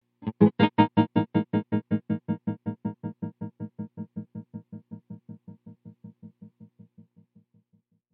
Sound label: Tremolo Square